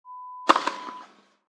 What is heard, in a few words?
Tennis serve